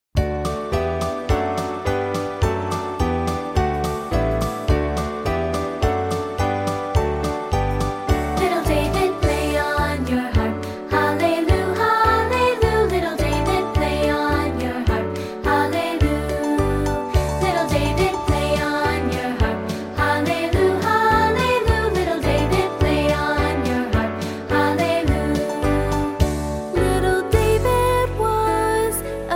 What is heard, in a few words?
Little David Play On Your Harp